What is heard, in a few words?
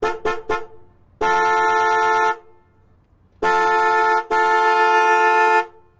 Car Horns